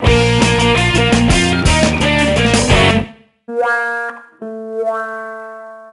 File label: Lose tune; Squeeballs Party; nds